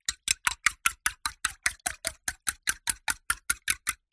spoon stir hot water tea or coffee in mug

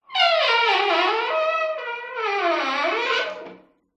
horror door heavy metal dungeon creak squeak

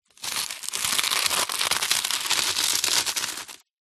food crispbread squash crush on wood

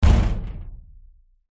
impact metal door fist rattle; zapsplat

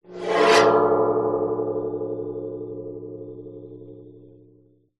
musical instrument gong gamelan scratch

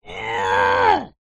human male scream strain lift; zapsplat

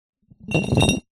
carboy demijohn roll hard ground; zapsplat

